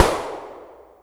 gunshot - IDFT(abs(DFT(sample))) post-processed by SRFO